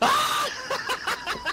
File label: laugh